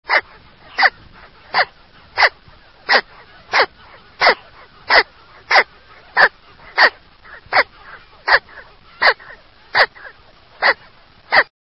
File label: American White Pelican